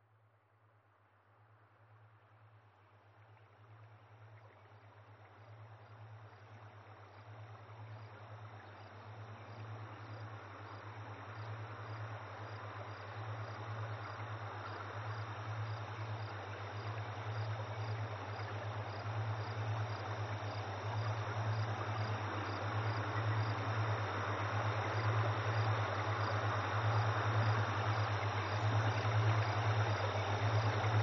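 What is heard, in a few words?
ambience and alpha frequencies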